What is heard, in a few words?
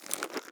Elastic Soft Rubber Double Creak